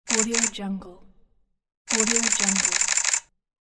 camera shutter

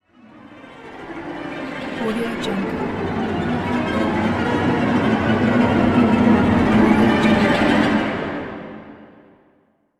chaos horror strings

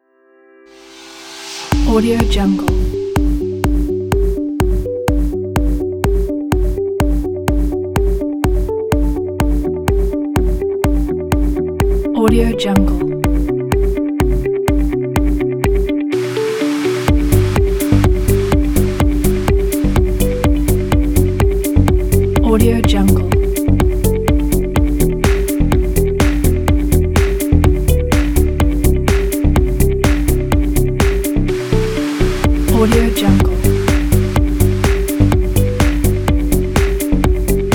corporate tech

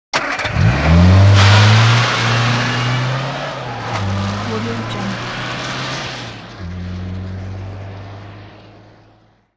car with mini start up and drive away; hollywoodedge

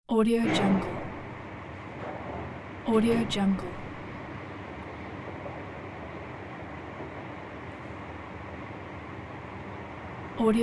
industrial background noise pack

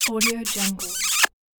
kiss smooch

